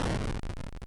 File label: Close; Liberator